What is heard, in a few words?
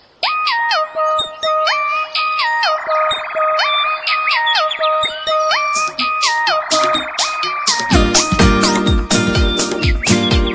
Airing; Pantech P2030-Breeze-3